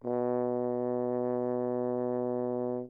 horn mf B2; My recorded note

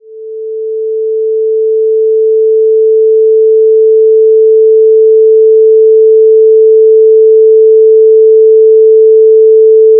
pass 440

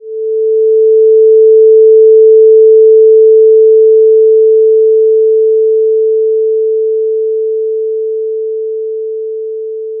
ring 440

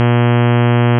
triangle reg

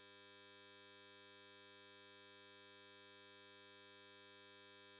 round error 100 8

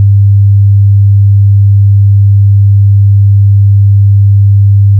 round sin 100 1

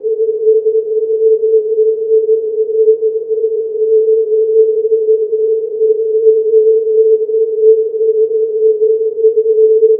noise 440

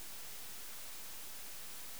240Hz tone 8bit rectangle 2lsb removed

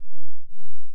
long sin

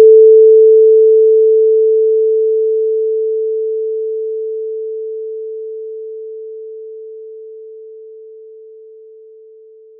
ring 440

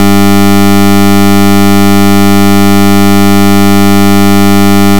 round sin 100 1

